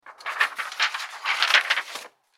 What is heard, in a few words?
paper falling